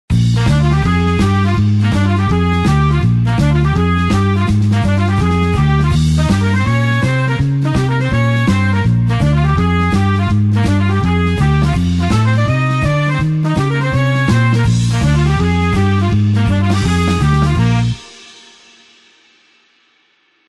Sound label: blues horns up Bb